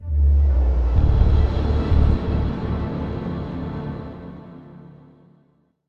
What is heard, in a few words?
Action Street; The Amazing Spider-Man 2